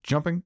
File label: jump